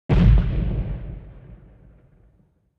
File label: Explosion Large Reverberant